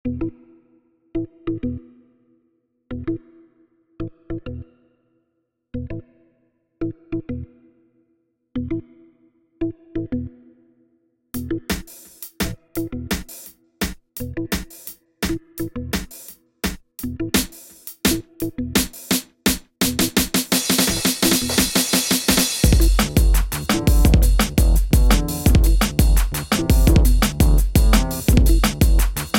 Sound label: plastic drums; parties; vammala11